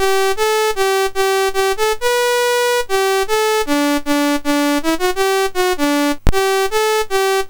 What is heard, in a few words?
C Prog Ref Guide example BASIC, Commodore MOS6581R2; DEMOS